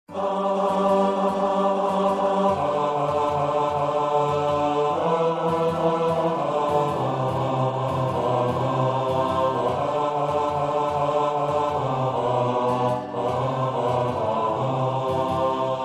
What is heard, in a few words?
Bass